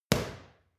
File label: boxing paw hit hard